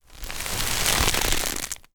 rope tension cloth pull twist foley; empire game profession

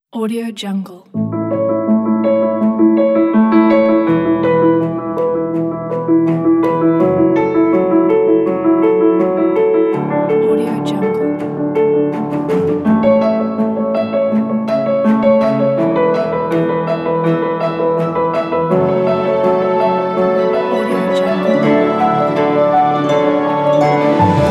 classical music

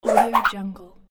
acrobatic spinning whoosh

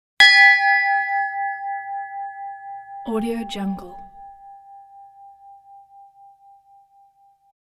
single strike small church bell in g

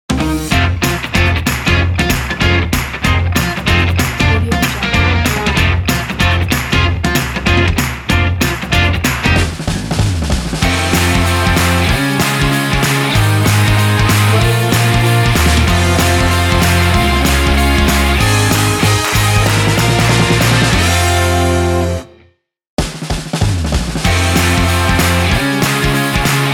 uplifting intro logo

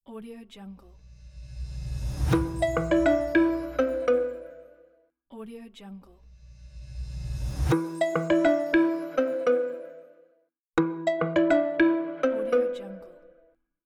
corporate logo intro

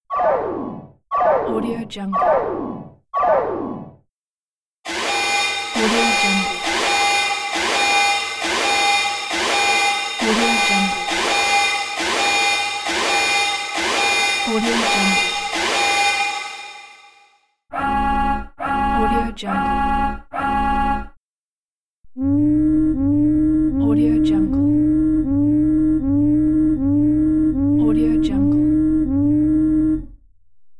starship alert pack; efxideas

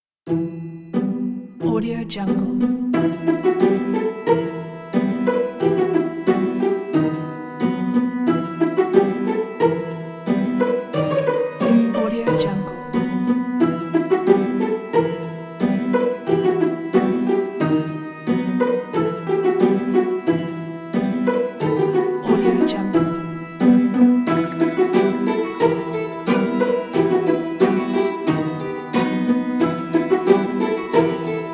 mysterious halloween

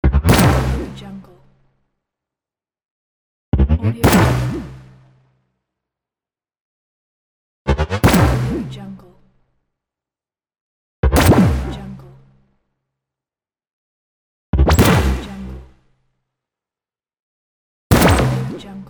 laser rifle

